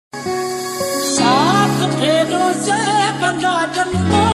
Maha Shivratri Song

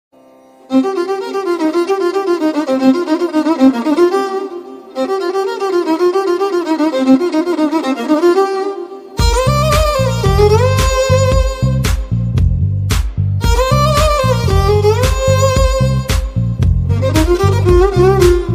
bgm tamil

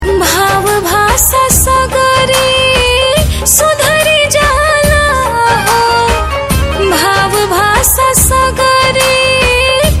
sad song

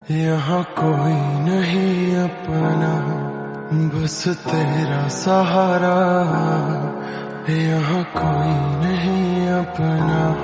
Devotional

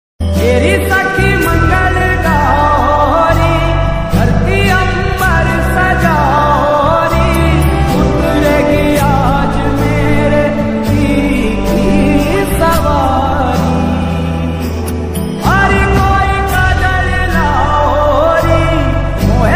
Lofi

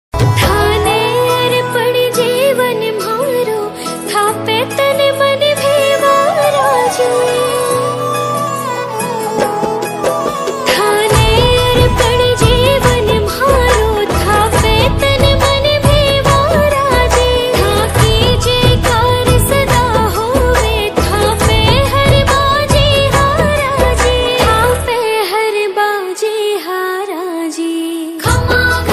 new rajeshthani song

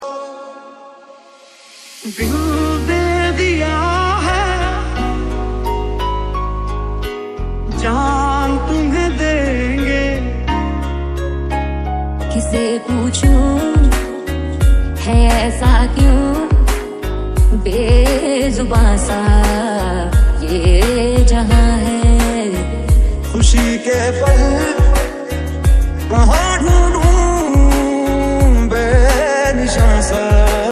Punjabi song

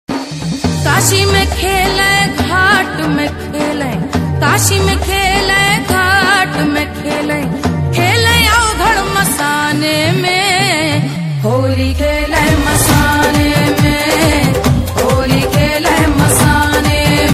Hindi Bhakti Song

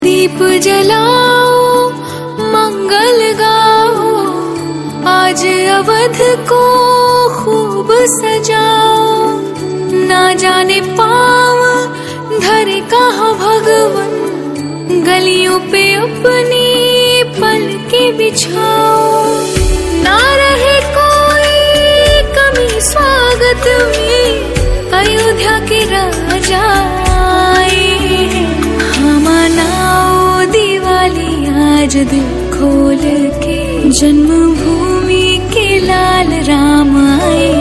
New Song

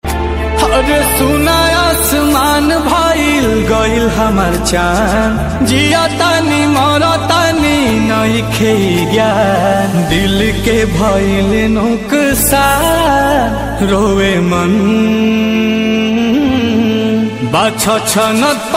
Bhojpuri Dj Song

